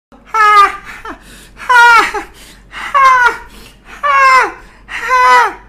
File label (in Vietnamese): tieng cuoi hahaha; tiktok; PewPew